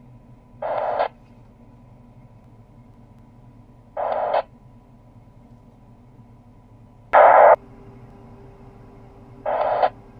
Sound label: Siriussat-1 fm-fm-usb-fm